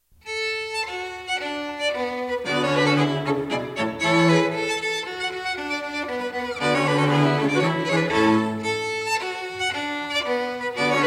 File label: String Quartet; Mozart; String Quartet No9 k169